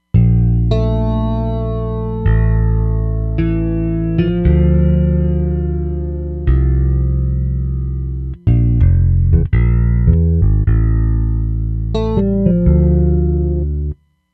Chorus Bass

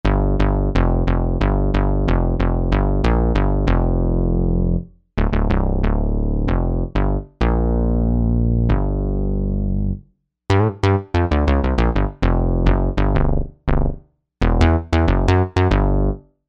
Mondo Bass

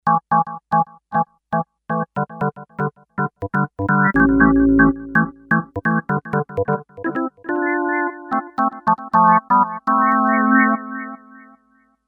Organica, Kawai K5000S

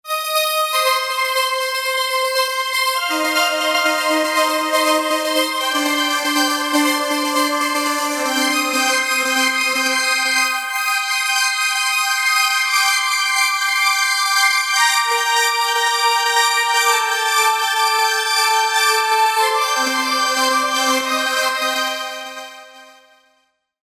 Gallop, synth

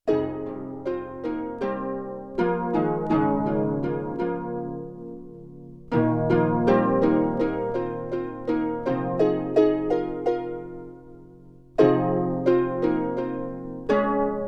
New Age Poly 5ths, Yamaha TX16W Harp

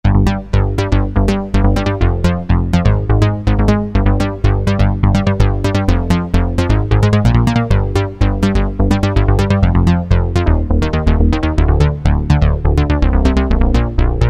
SequencrBs, Roland Alpha Juno-2; Factory A

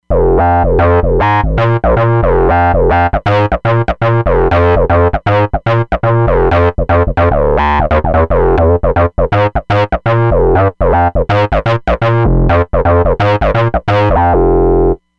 TBasic; Roland Fantom XR

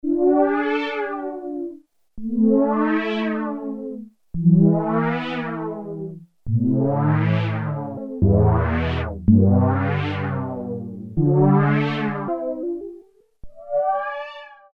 VOCAL WOW, Oberheim OB-8; Factory Patches

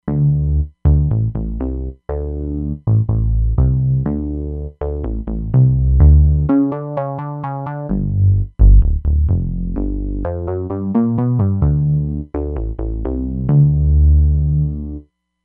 Electro Rubb; Roland Fantom XR

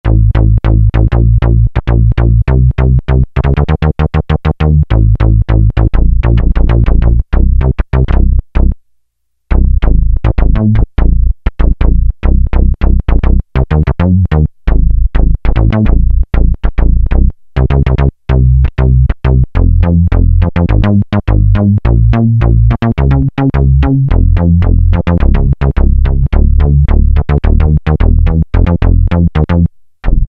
Funky III, Roland Juno-106; Factory Preset

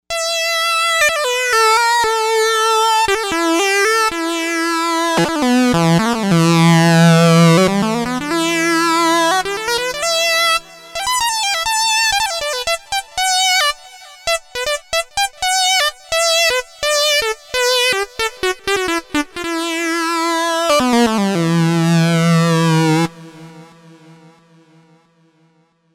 8-Bit Megalead, Novation UltraNova; Factory Patches

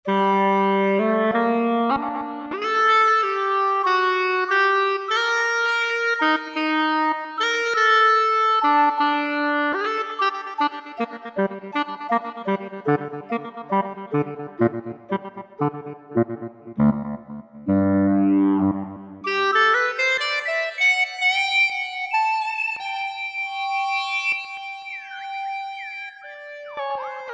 Roland V-Synth Lead; Factory Patches

